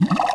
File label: water; Charlie; Game Examples